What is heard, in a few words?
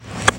sand; Jack